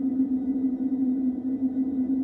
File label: Inside wind hall; zps; Ambient